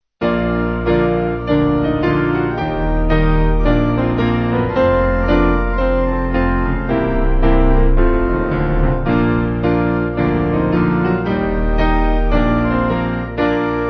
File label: Piano Organ